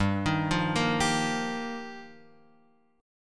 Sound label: G Minor 7th Sharp 5th, Guitar, Open F; Gm7#5; arpeggiated; JGuitar; x,2,3,0,1,2